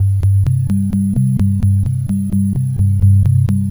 ambient bliss G 129, synth loop; Zero-G; Soundclash; Nu Dance Electro

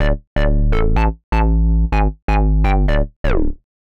Hard Synth Bass, 125; PowerFX - Nu Jazz House

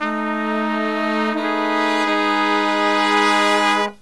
Killer Brass Riffs; USB Soundscan vol.29; AKAI

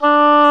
Oboe, Orchestral Winds

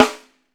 RIMSHOT; Sampleheads - New York City Drumworks VOL-1

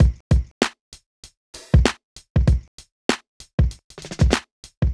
Drums, Hip Hop

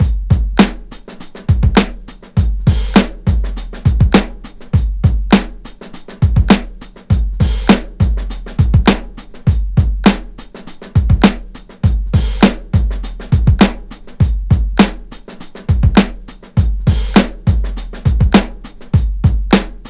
101.4 Loose Dustbin, breaks; Lockdown Breaks

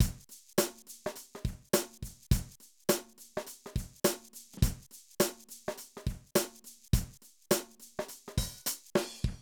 Drum loops, 70s funk

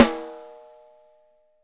5X13, Drums; Big Fish Audio; Ross Garfield Drums VOL-2

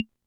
Perc, rhythm330; classic machines

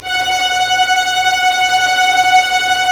STR, Roland LA Composer; Roland - LA Composer vol. 1

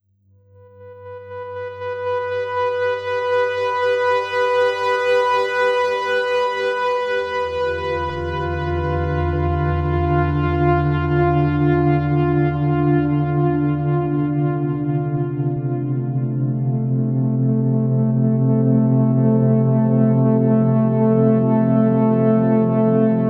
FM Melodic; Sonic Foundry (Sony Creative Software); Slow Silhouette

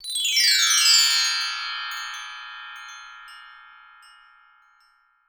BRASS M, TREE BELLS; Voice Crystal - Steve Reid Definitive Percussion

